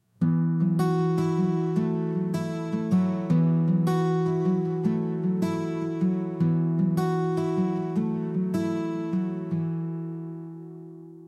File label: lullaby, name songs; amara